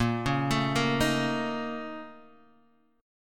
A sharp Minor Major 11th, A sharp, down, Guitar, Half Step Down